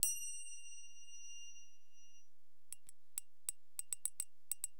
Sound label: FBELL, Perc, Acid Jazz Funk; LiveAct Pool 1